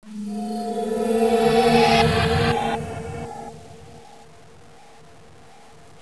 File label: scary